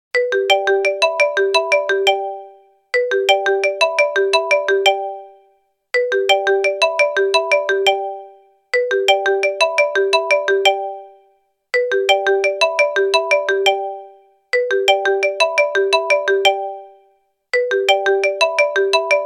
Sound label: Marimba Music; iPhone